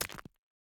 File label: environment pickaxe hit stone